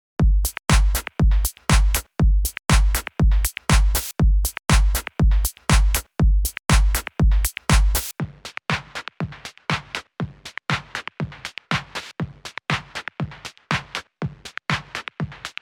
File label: Tape Mello-Fi Drumloop; ARTURIA